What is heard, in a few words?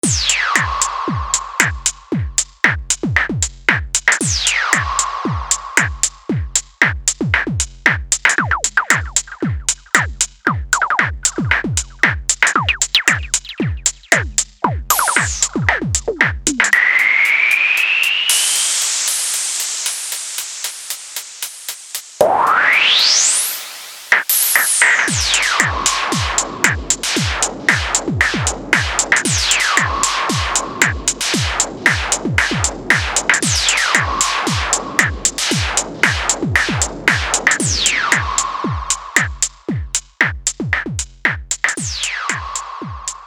Space Crash